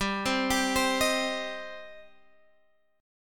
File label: G Suspended 4th Sharp 5th G down Guitar Open G